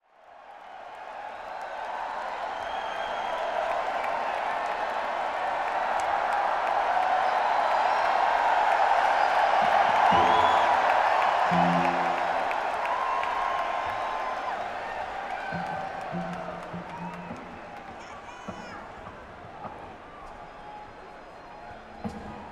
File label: Crowd, Madison Square Garden; New York, NY